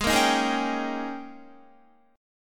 G sharp Major 7th Suspended 2nd Suspended 4th Guitar